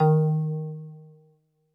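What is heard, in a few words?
Jazz Harp D#2; Kawaii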